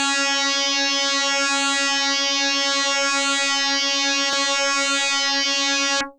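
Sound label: Electric Bass DX100 C#1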